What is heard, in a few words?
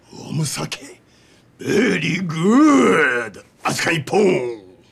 Sake, cocktail party